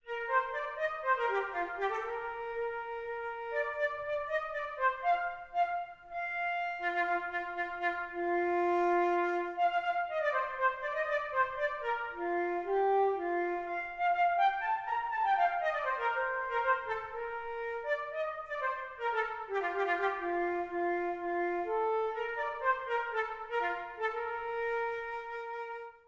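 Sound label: victory flute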